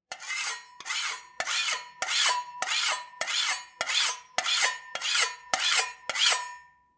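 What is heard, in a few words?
scraping sound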